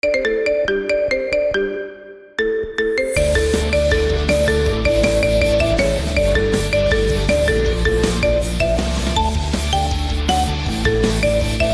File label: Marimba Remix; Totally Spices